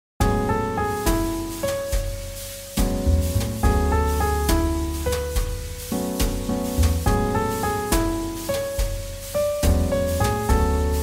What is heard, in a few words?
JazzBallad; 잔잔